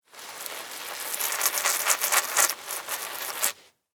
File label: Pesticide Spray, Extermination, Insect, Bug; Extermination Sound Effects; SoundEffects-BlastWave